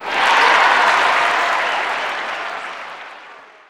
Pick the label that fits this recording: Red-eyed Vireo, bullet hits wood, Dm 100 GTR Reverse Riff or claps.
claps